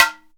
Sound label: EMELABO HITS; Best Service; World Colours